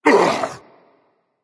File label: grunt hit berserker; cuchulainn